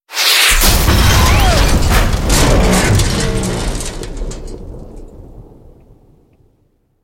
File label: tanker explosion